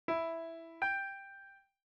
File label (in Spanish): Intervalo de 10ª